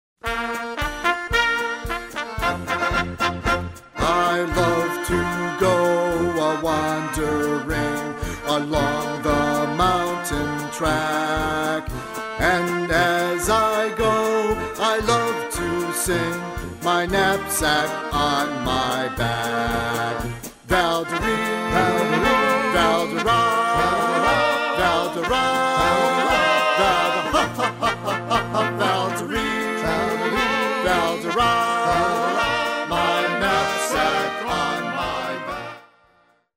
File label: German Band; Chicago